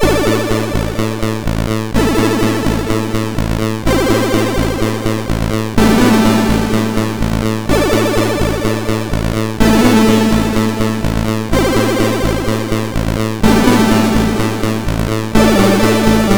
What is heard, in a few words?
Arpegios, MOS6581R4